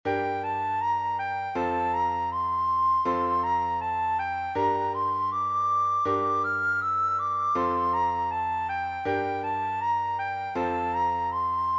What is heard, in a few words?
Scottish Bransle